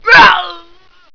die; wartso; barney

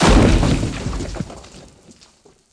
concrete

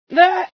pain, female sci

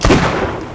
fire; Jakobs; hyperion

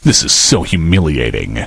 die; postal dude

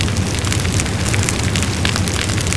burnin

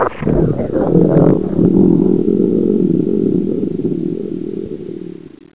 die; coldburn

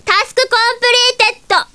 cheer

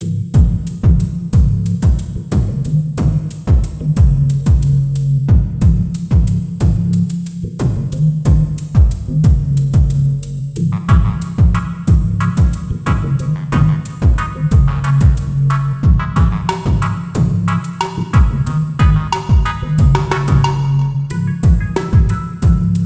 keygen song; sven

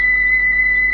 laser

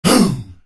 tribal pain

